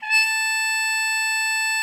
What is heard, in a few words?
HARM A5, TR MUTE; Xsample VOL-3